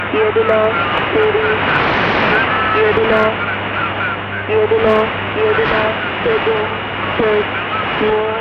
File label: Number Station